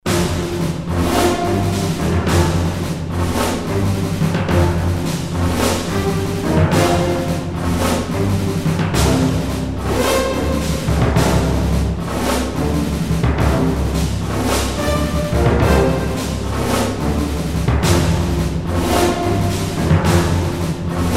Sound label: Confident; bridgecommander